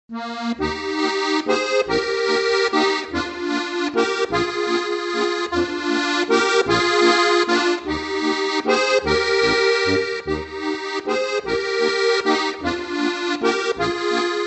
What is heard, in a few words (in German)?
walzer